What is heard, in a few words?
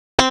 GUITAR 130 BPM delay; SONIC ELEMNT; SONIC EL YES